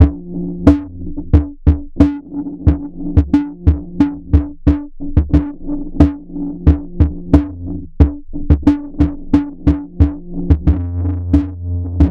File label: E90 Groove Dials